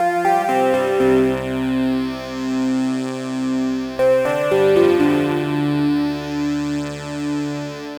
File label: Bright Synth Lead; Sonic Foundry (Sony Creative Software); The Electronic Music Manuscript Collection